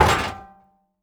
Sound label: ladder hatch deploy; Rust